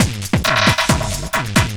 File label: LOOP, Drum & Bass, 135 BPM